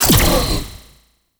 Void Dagger Proj Shot, melee weapons; Warframe; Zariman Dagger; Void Mode

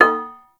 Glass Tap; Ambience; Props; Void Kid; Warframe; The New War; Zariman

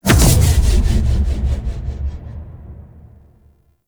Medic Bubble Fire; Grineer; Space Fighter Medic Agent

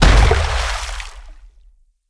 Infested Femur Step Water

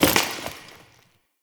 Follie Enemy Step; Warframe

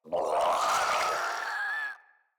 Infested Crawler Death; Crawlers

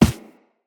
Operator Run Default, Footsteps; Characters

